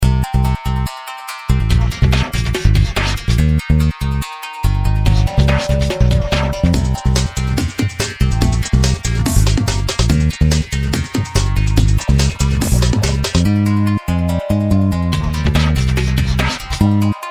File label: instrumental